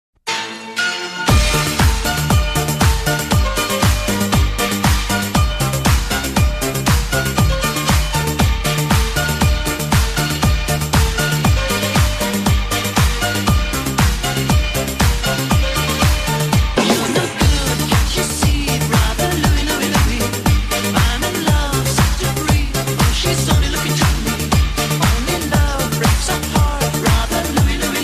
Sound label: instrumental ringtone english ringtone